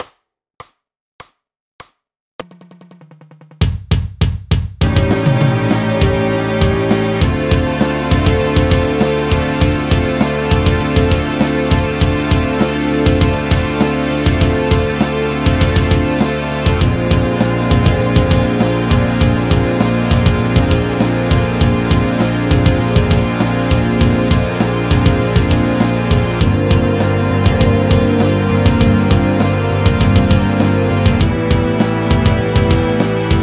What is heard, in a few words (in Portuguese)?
sem guitarra; Rock Discipline